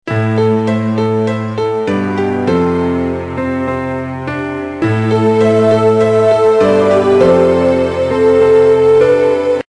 Loops Medieval

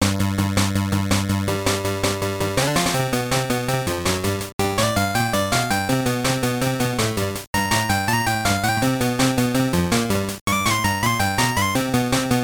BGM; WWF War Zone; GB

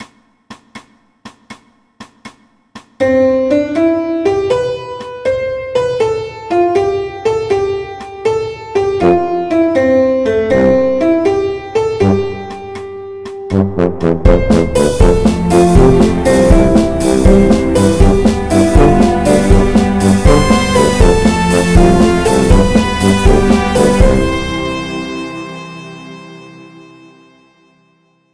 piano microgame fort; horace ps4 switch windows xbox one gamerip 2019

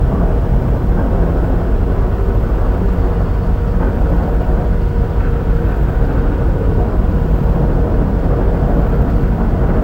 Ambient Reactor; Among Us

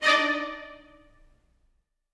Chippy sting; minecraft story mode gamerip 2016; Access Denied

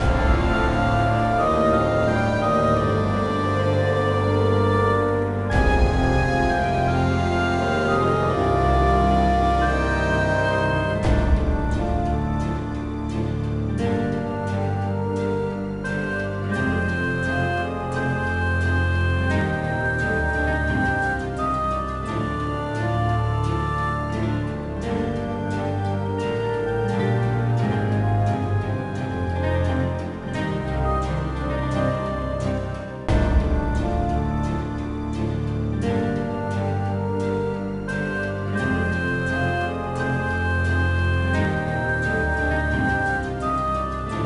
BGM Defeat Greek; Age of Empires Mythologies; NDS

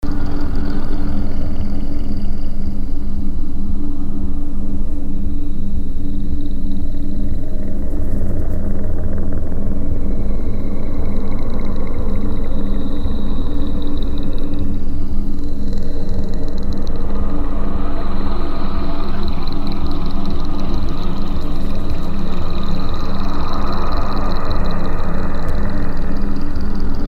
Pocket Dimension Ambience

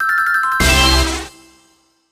Success

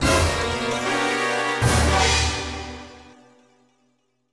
Action END

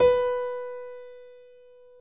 B Piano

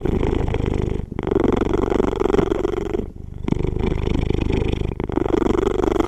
Background Сat Purring Sound; Pixel Gun 3D

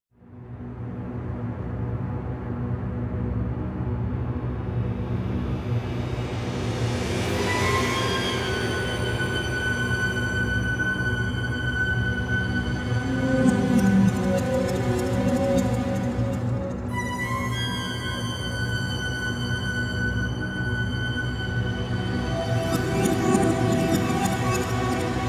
Sacrificial Altar Ambient

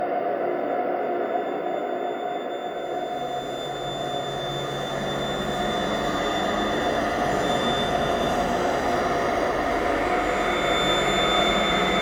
Mine Tension; Outlast 2; PS4 Windows Xbox One

